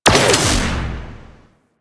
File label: Space Fighter Ship Turret Fire C; Warframe; Corpus